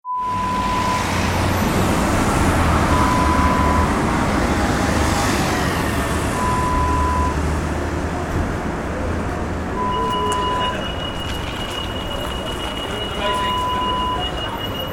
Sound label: Street crossing sound